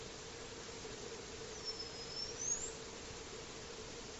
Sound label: Dolphin whistle train